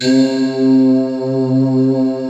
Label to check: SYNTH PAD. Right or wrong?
Right